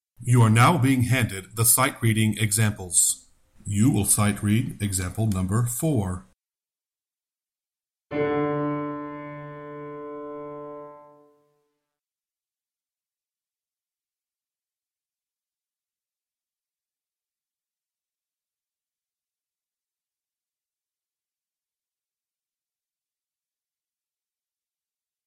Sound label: Sightreading Example Bass